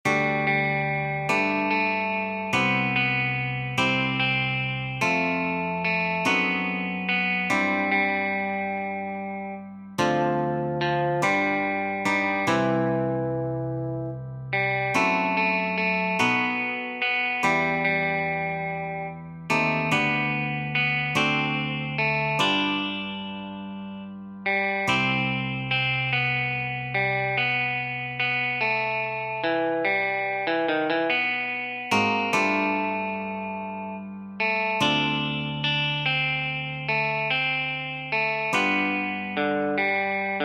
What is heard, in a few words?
Electric Guitar in C